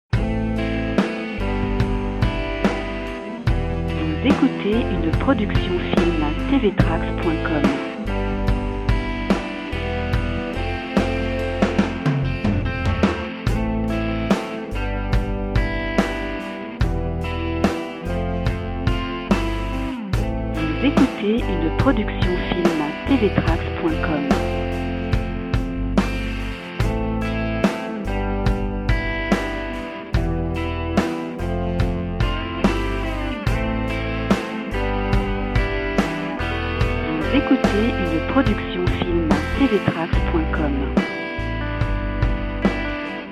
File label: rock